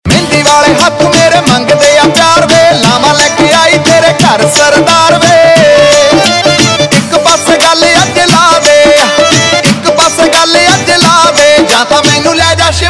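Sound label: Ringtone, Punjabi Pop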